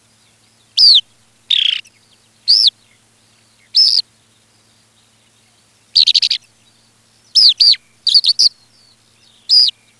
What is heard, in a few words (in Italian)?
allodola, birdsound